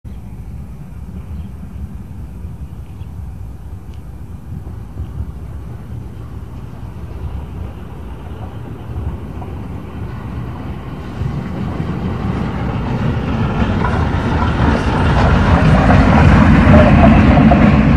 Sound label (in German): Vorbeirollen, eisenbahn; Aalen